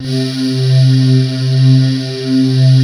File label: airvoice C3, wavesynth; InVision Interactive; Lightware VOL-1; Instruments & Percussions